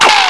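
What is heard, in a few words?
cannon; IO CD 1999 April; DARKMOON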